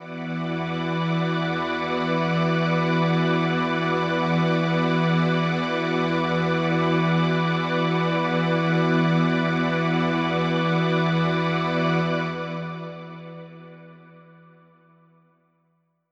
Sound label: Ambi String E7:9, synth orchestra